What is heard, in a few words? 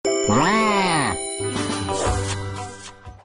coin; ringtones